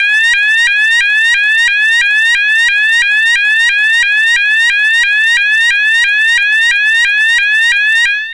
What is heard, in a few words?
Sounder; Two Tone Alarm Siren - 970Hz/800Hz; Weather Proof Sonos Electronic Sounder SS240W